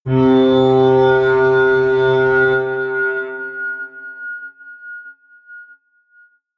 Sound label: atmospheric feedback synthesizer low, drones